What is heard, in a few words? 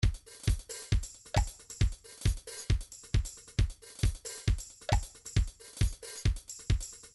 trance midi loops beats